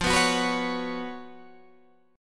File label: B Suspended 2nd F, Bsus2/F chord; strummed; JGuitar